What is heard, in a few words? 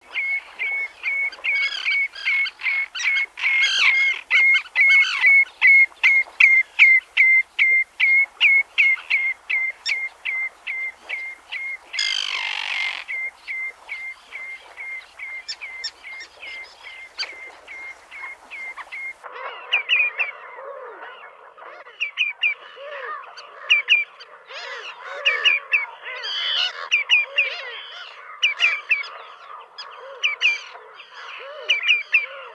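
travnik golos, common redshank voice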